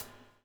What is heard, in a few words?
BUBL, Backbeat; Spectrasonics - Backbeat